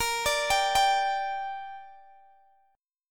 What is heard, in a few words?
A sharp 6th, Guitar Open G